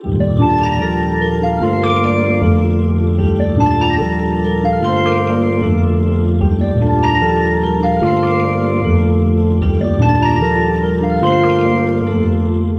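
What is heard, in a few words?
synth loop piano grains 150 G minor; Virtual Riot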